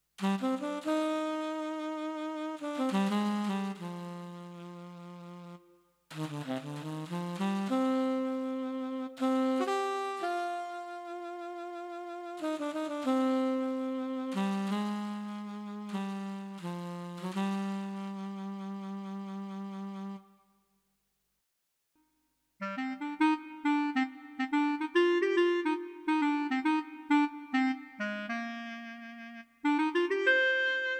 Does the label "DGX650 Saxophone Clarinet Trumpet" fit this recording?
Yes